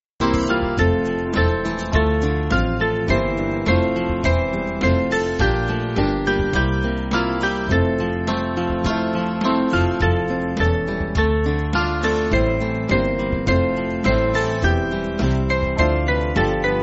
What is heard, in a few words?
Band, Backing Files for Churches; O Christ In Thee; McGranahan